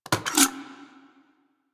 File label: Fire Mech, Flame Thrower; Weapons; Warframe; Ignis Wraith